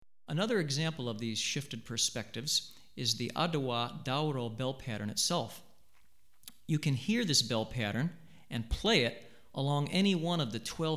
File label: Adowa, Drumset Example, West African Rhythms For Drumset; Hartigan